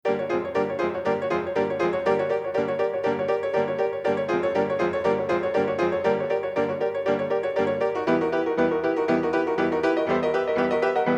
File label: barroom piano